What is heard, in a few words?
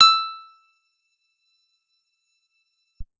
guitar acoustic, acoustic guitar nylon